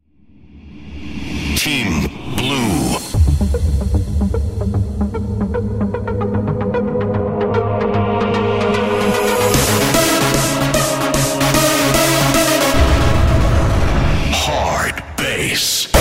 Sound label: Hardbass